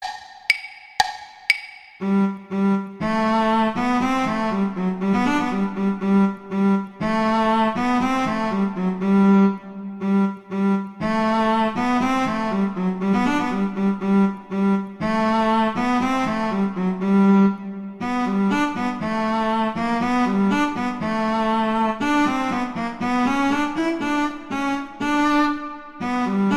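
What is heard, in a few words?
Duet 8 Cello II accompaniment; Duets; Mozart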